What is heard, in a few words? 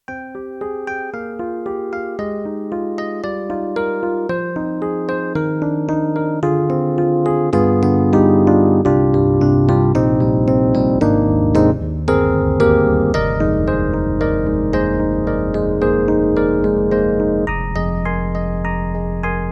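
YAMAHA MU1000EX Flips, piano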